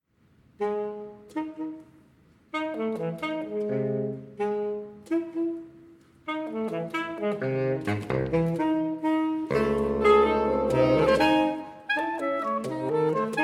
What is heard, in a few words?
saxophone quartet audio sample